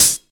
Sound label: good pedal hi hat sound b key; hat samples